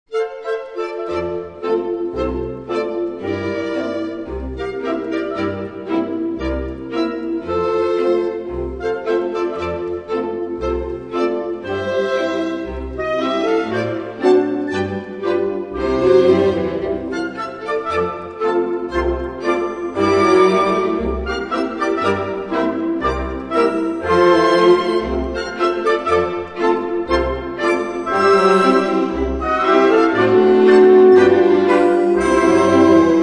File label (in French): gavotte; J'y pense